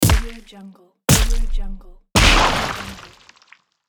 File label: gore smash explosion